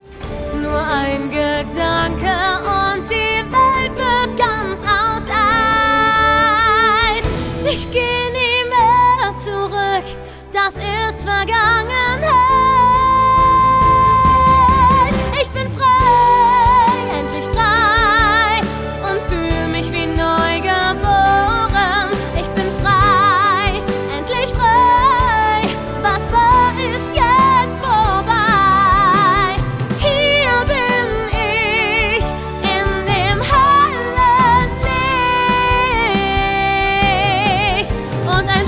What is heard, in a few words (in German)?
Gesang kraftvoll